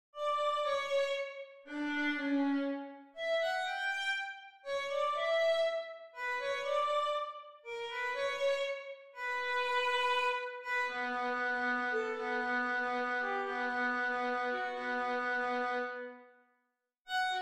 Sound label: summer violin melody